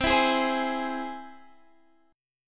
C Augmented down; strummed

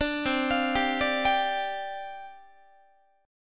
C Suspended 2nd Suspended 4th, down, Guitar

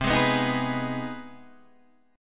C Minor Major 7th Sharp 5th, Guitar, Open D; down; chord shape

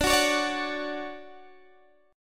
D Major 7th, D, down, Guitar DADGAD

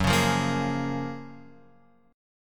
F sharp Major 9th; F#M9 Chord {2 4 3 3 X 4} strummed

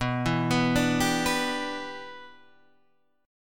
E Minor 7th B

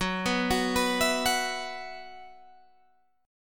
B Suspended 4th Gb

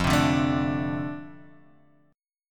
F 6th F, chord; F6 Chord {1 3 0 2 3 X} strummed

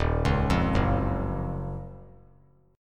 Eb Minor 9th Eb; Ebm9; arpeggiated; JGuitar

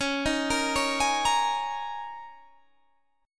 D sharp 7th Suspended 4th

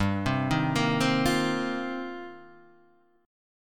F 7th Suspended 2nd Suspended 4th

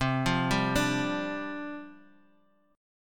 C Suspended 2nd Suspended 4th, down; Csus2sus4 arpeggiated

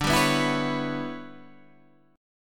E Minor 13th D

